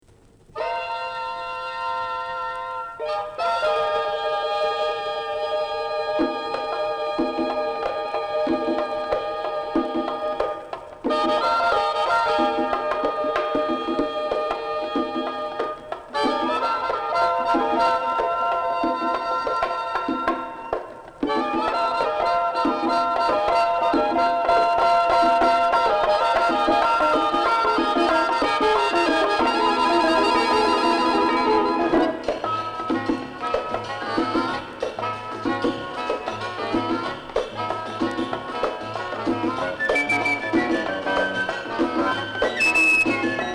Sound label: JAZZ